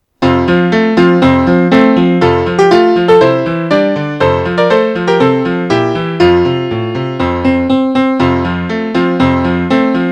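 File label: school song piano Instrumental; 福岡県筑後市; 古島（こじま）小学校; 筑後市立; 古島小校区（古島・島田・井田・折地地区）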